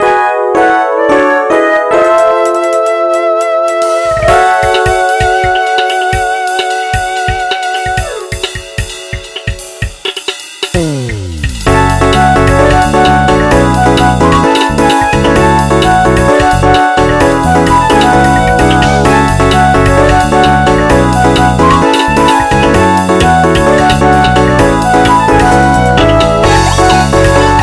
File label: Overworld xg funk remix